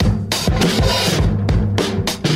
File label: Drum loops, Boombap